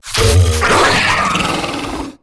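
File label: creature langlatch attack heavy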